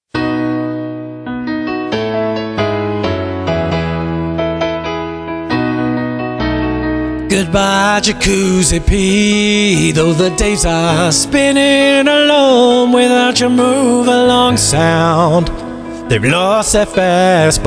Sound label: Fart In A Jacuzzi